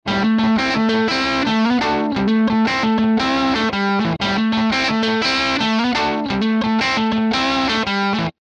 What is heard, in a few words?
parametric equalizer; orinj